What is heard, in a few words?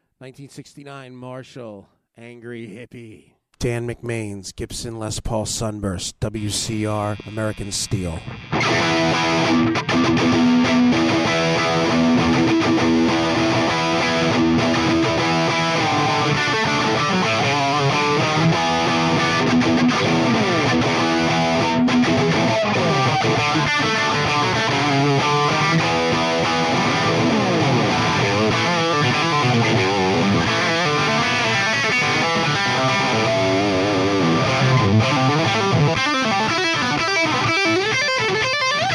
marshall 69 bridge